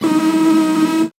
VocoBit Noise, 8-bit; musicradar; 8-bit bonanza

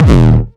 909 Dist Reso - D#1, Kicks; Mbase 11 Kicks

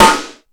Ambient Rock, Drum Machines

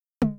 Tom Analog, Yamaha RM1X; AnlgKit01

